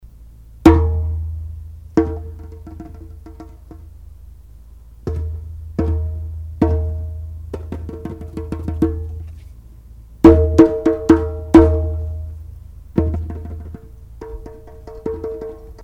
bongos

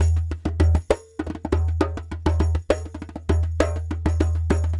Lg Dumbek

